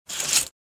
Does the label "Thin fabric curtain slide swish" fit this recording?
Yes